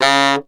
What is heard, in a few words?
Tenor Sax Portato C#2; DirectWave; Varazdin Orchestral